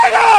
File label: scream; sega